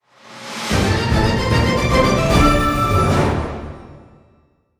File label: Boss Win; android ios gamerip 2015; Dragon Mania Legends